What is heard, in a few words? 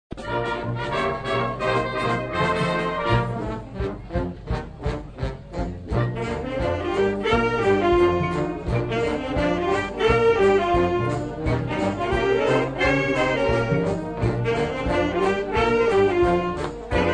Saxology